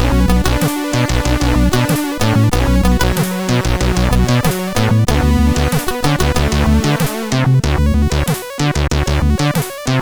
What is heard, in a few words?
sid CSG8580R5